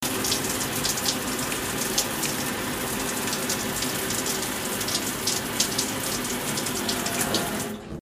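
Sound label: bath; huro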